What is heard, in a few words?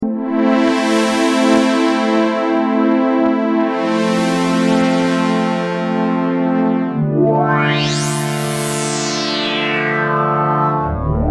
prophet 600